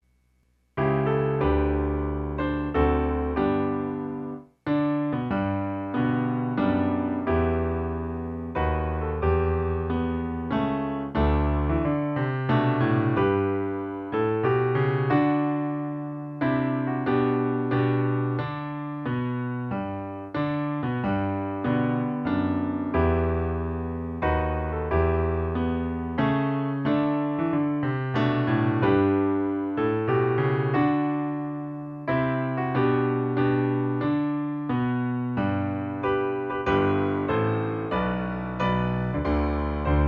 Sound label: piano